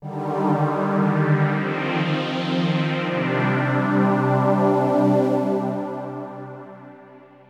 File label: SYNTH PAD AMBNT 160 C, Ambient; eJay; Sphere